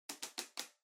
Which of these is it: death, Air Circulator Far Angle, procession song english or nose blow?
Air Circulator Far Angle